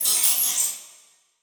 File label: Multimedia Internet CD-Rom Flash Blast